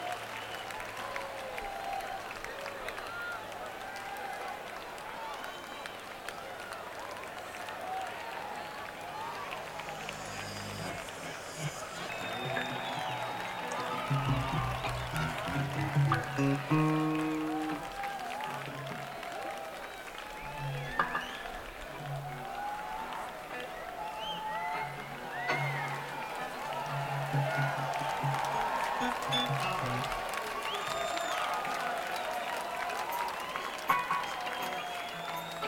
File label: Crowd